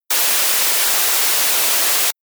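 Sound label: Synth Filter High pass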